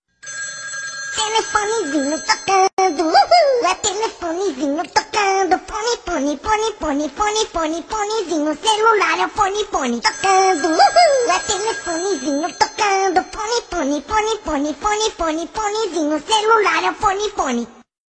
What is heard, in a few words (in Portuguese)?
olha o telefone tocando